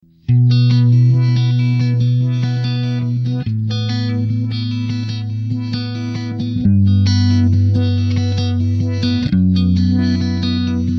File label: space phase slow